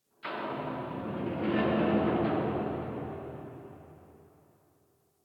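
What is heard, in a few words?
Distant Metal Garbage Wronk; Warframe; Ambience; Nineteen Ninety Nine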